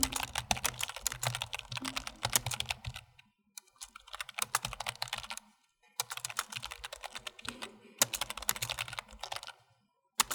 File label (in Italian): Tastiera